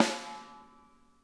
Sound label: snare, drum kits